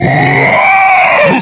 Clot Death

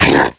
Jump; KF Christmas; Patriarch

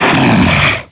Scrake Pain

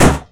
Balloon Popping

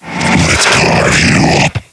Scrake Roam

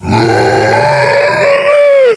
Clot Roar

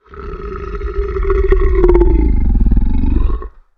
Growl

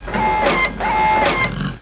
Land

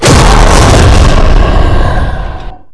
fire

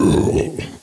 Bloat Idle; Bloat Santa

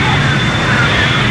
redeemer flight; Monster Hunt

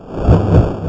snipe fly

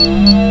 target hum

